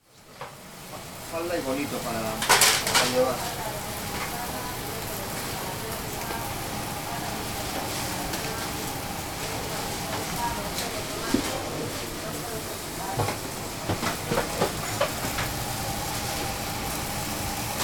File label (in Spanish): ruido cocina (intercomunicador); bilbao; iñakiren; soundfood